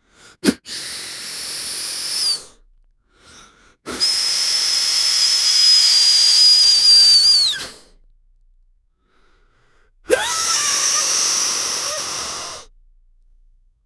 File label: man inflates balloon